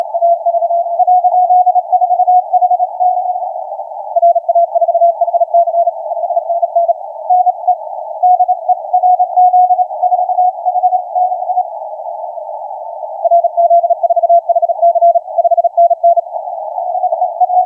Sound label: 20m